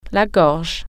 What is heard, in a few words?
throat